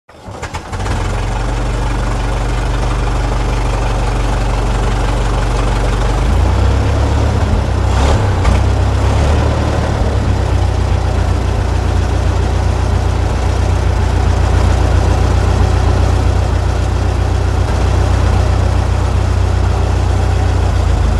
Ford Bonnet Up, Petrol Pump Sizzle, Cranking Over Engine On Starting Handle, Car Starts, Fast Idling And Away Interior, Finally Stops And Switch Off., Model T